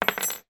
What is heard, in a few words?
household yale key drop on table